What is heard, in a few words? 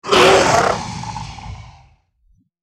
pain, dragon white tiny